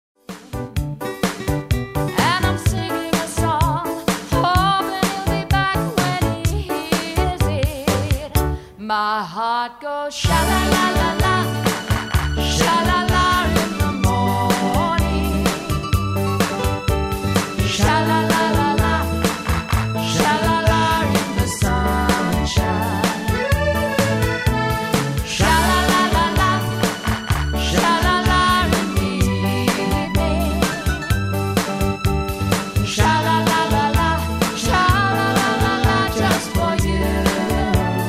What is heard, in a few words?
shalalalala